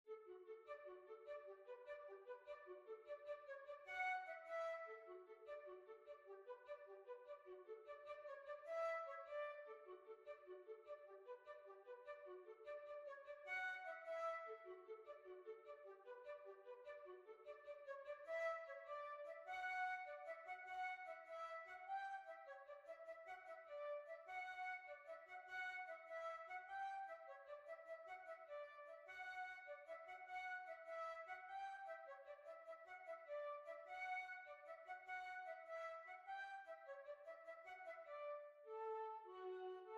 Jig; Sally Sloanes Jig

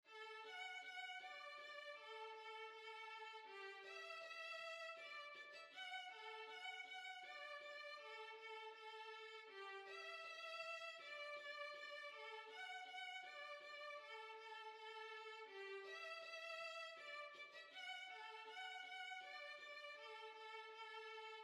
Polka, Violin; Alborg Polka

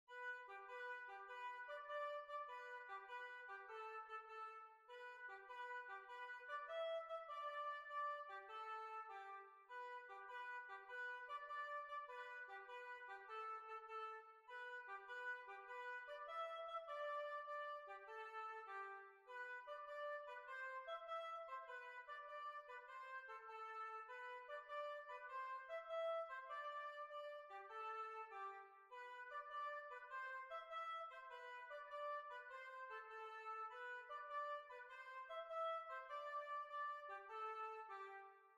Jig; Oscar Woods Jig

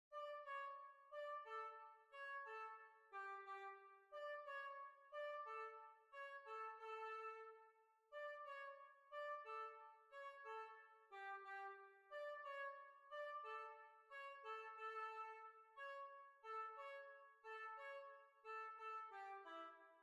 Swiss Waltz; Swiss Waltz II

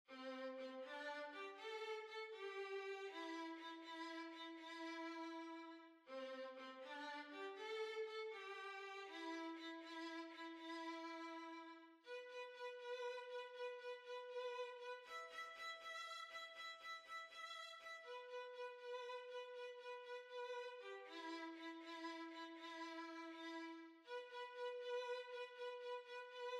Violin

